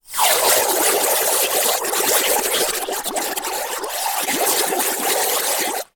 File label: compressed air spray into water bubbles; industrial